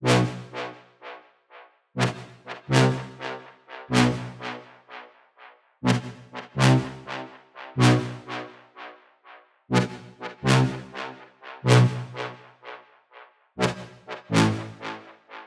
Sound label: 124 BPM E Min Brass; Melody Loops; Loop Stems; Cymatics; Anubis